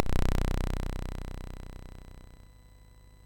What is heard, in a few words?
Project Firestart, MOS6581R4